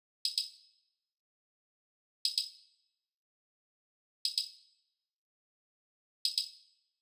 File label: Percussion, 120bpm D; Construction Kits; Zero-G; Nu Disco; Happy People